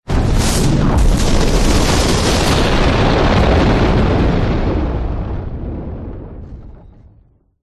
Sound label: explosion fire burst backdraft; zapsplat